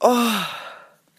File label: moan